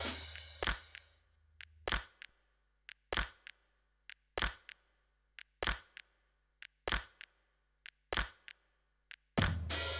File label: TOPS, viral hiphop